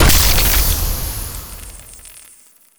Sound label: voidspawn missile; Stellaris; distant stars